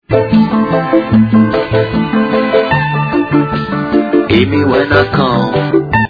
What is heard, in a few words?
bongobong